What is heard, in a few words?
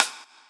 Rimshot; Equipped Music; Loungin House